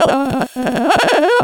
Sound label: pleasure moderate; VIVAE